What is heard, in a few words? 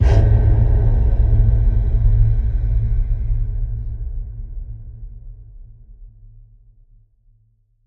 Breathless Low Boom